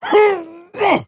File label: attack effort large; dota; axe